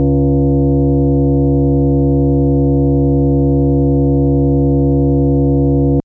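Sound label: FM Sawtooth DX100 C sharp 2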